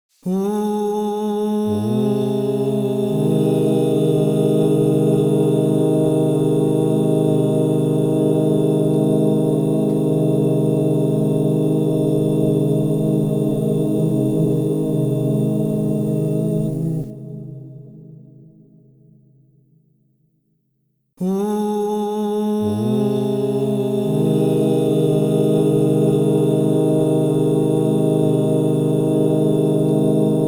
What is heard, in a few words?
La bemol major, crystal bowls; Yoga and sound